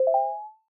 success

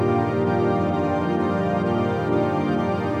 pad

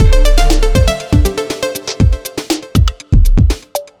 Loop

